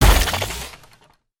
car backfire; aronwk collection; ndaudio

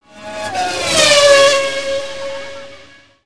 Car Passby Pitch Up

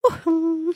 dance; emotes

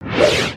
ship put away

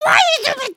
failed build; emotes

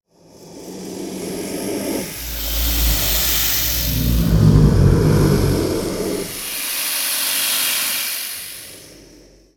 Serpent Hiss Loop Mono; destructibles

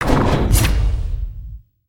Knight Roundhouse

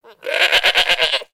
Pets Goat Beg; creatures; Lego

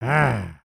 Walk, creatures organic

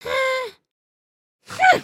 indignant, emotes

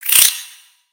Spider Attack Ranged; Lego; creatures mechanical

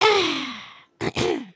headspin, emotes

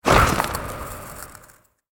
Ice Shuriken Throw; Spinjitsu

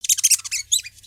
Pet Rabbit Speak; creatures pets

